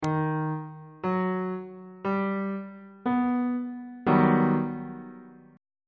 f double sharp augmented major 7th 2nd inversion on bass clef